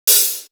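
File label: Hi-hat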